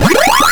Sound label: Eat Ghost